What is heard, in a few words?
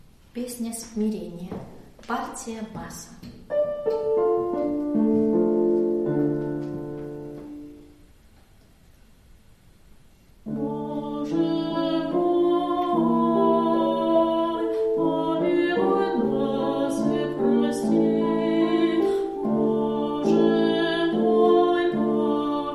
bas; Pesnja smirenija